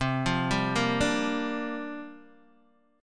C 7th Suspended 2nd Suspended 4th C down Guitar Open D